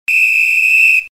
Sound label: whistle sound